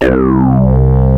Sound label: SPLIT; Rubber Chicken Software Sample Bank